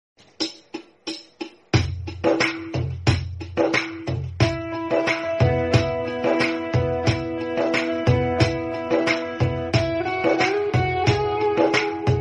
Instrumental Ringtone

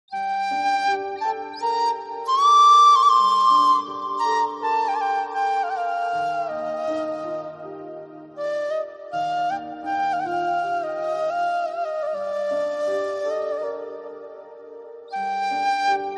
TELUGU CHRISTIAN BGM FLUTE RINGTONE

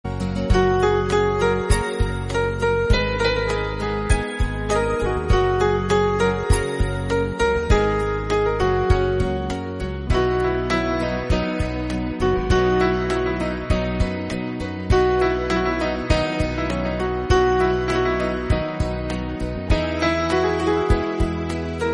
INSTRUMENTAL RINGTONE

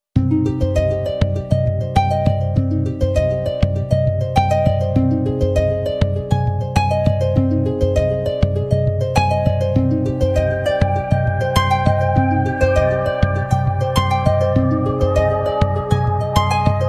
SONY ALARM RINGTONE

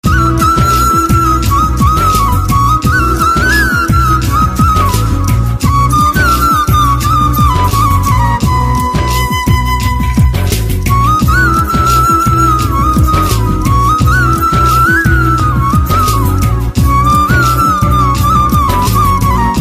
flute ringtone

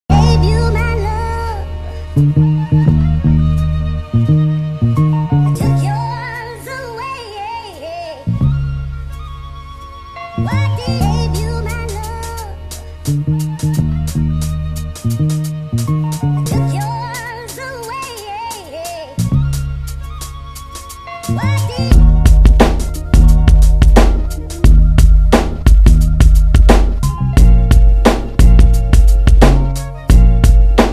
HIP HOP OLD SCHOOL INSTRUMENTAL RINGTONE

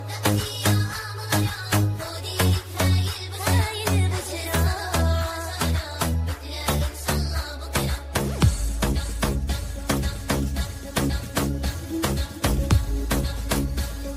Arabic ringtone

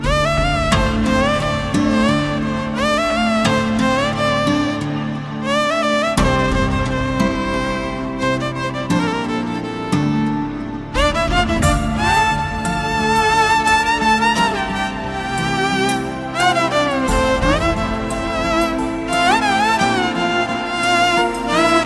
FLUTE RINGTONE; BAHUBALI

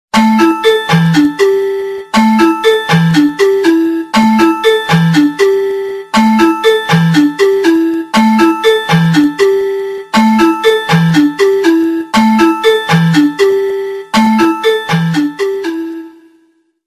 NOKIA BOTTLE TONE RINGTONE

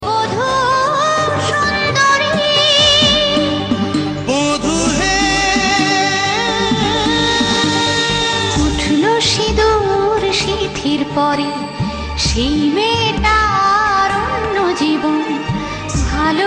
Bengali ringtone

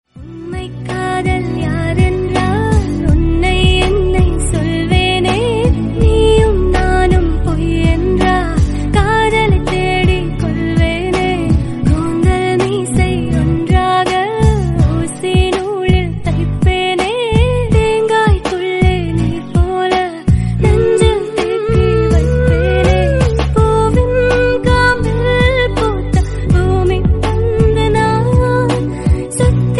FEMALE VERSION RINGTONE

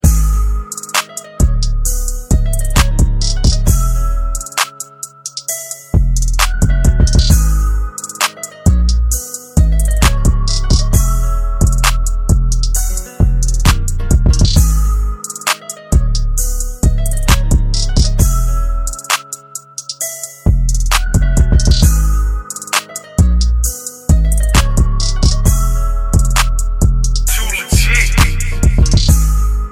instrumental ringtone

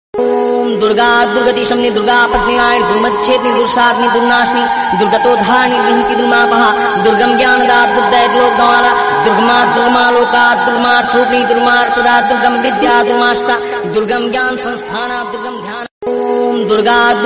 MAA DURGA MANTRA ODIA

